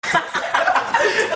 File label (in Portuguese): risadinha infame